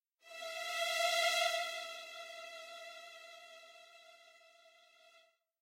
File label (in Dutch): violentrill; Toki Tori Collection